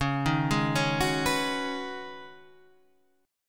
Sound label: Db 7th Suspended 2nd Suspended 4th Db down Guitar Half Step Down